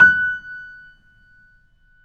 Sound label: BOSENDORF